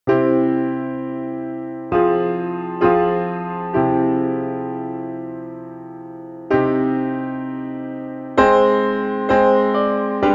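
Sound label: cinematic piano funeral march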